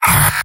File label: soldier pain sharp; vo; tf2; mvm